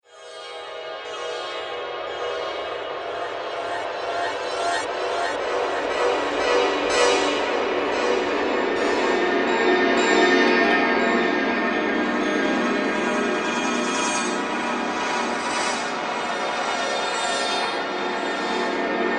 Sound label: Magical Drone; Magic Sound Effects